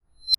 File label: Menu Select Button